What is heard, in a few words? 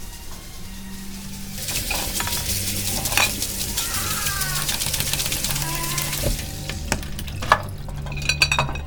clean and scrub